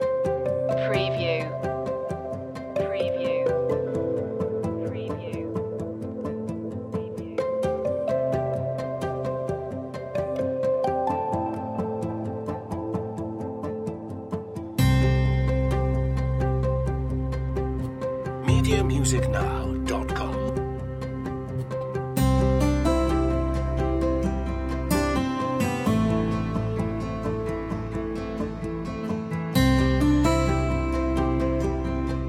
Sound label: joyful guitars, Acoustic Guitar